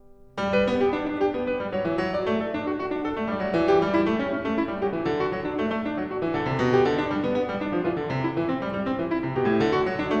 Bach Goldberg Variations BWV988 Variation 20 - Allegro